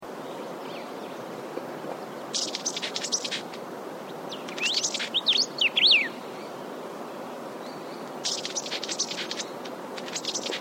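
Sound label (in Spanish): Picaflor Cometa, Sappho sparganura, Red-tailed comet; cantos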